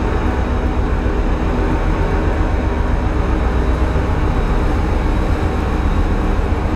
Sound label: ship engines; World Of Warships